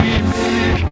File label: GRUNGE; AMG - Vince Clarke Lucky Bastard